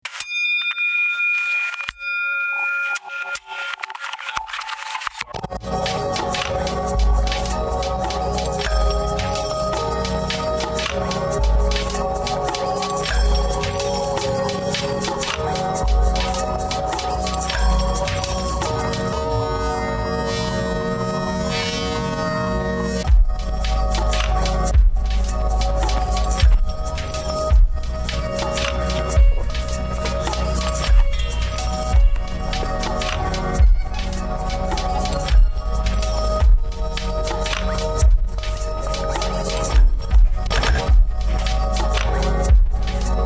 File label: Wind Loop Cicada Remix